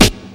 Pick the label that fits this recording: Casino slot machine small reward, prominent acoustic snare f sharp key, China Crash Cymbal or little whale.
prominent acoustic snare f sharp key